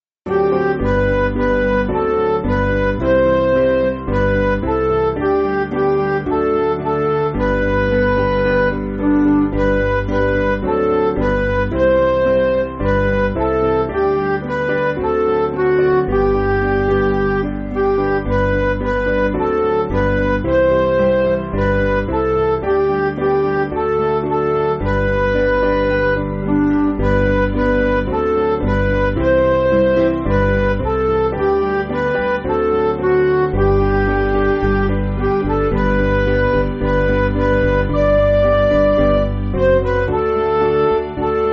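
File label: Music Backing Files for Churches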